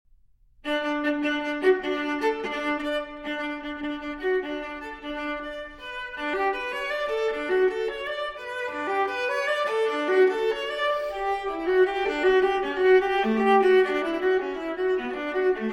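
Cello Suite No. 6 in D Major Transcription For Viola Prélude; J.S. Bach; BWV 1012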